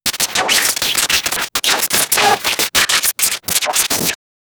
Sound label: transformation of reality, otherworldly